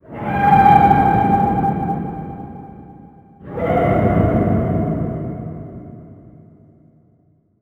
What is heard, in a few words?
environment forest creature howling